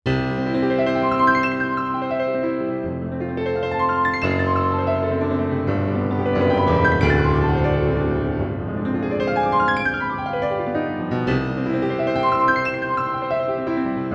Chopin Etude Op 10 No 1 Waterfall NY Steinway D Classical Recording